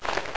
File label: mp5 handoff, weapons